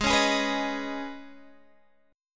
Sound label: C sharp 7th Suspended 2nd, G sharp